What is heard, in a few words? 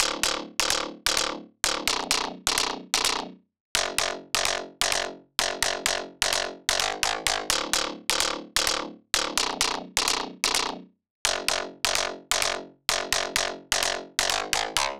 128 BPM F# Min Bass; House; Drop Loops; Cymatics; Mothership